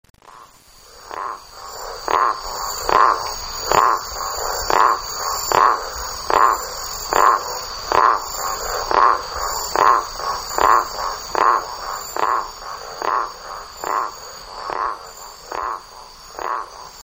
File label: hyla boans; guyane